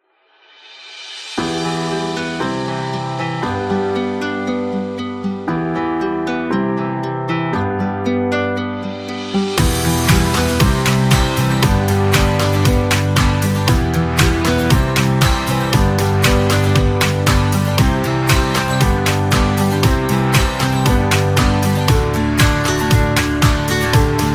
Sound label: Corporate Acoustic